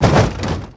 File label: ball drop; pod skyrace